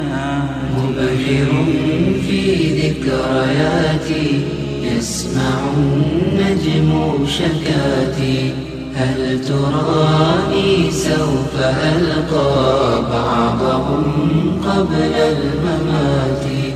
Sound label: islam naath ringtone; islamic ringtones